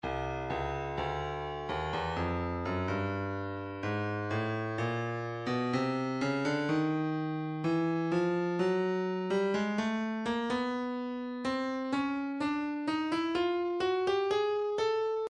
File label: percussion midi files ride cymbals 063 orient ride; Stylus